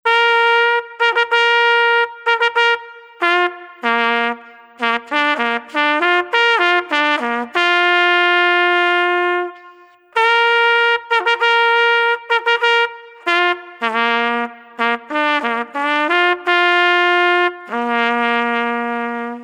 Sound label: Fanfare; Kinderturnen; Kinderturnshow3